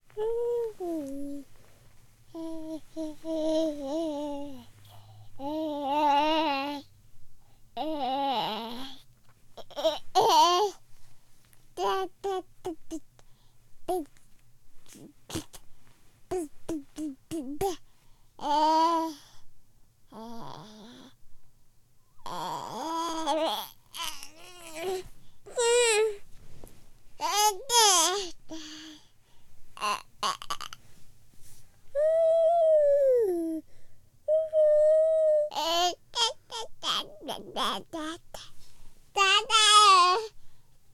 Baby Infant Vocalization Talking Cooing Relaxed Dada; 2496SoundEffects; Oh Baby! Pack 2 Baby Sounds